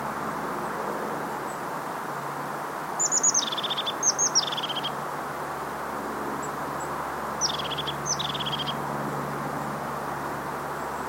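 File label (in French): mésange huppée bleue nonnette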